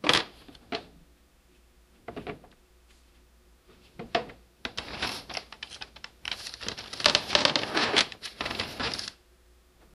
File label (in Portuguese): vasculhando mesa de labor; laboratory